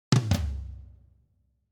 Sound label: Drum flam